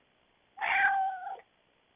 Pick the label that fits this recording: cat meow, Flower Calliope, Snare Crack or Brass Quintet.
cat meow